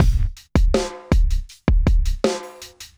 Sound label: Street Tip, R&B Drums